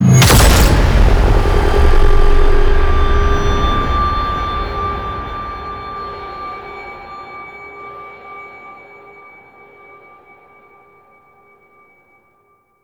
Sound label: Ship Pulse Explosion; Warframe; Quests; Wraith Quest